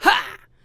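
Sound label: Mon Man ATTACK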